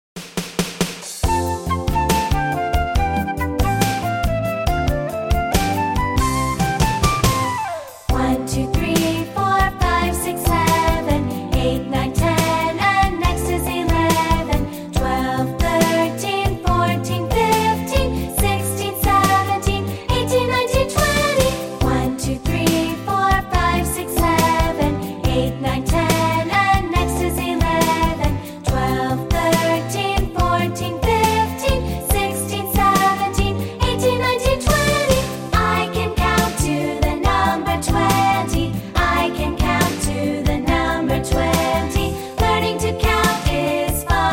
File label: Counting To Twenty